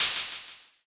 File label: open hat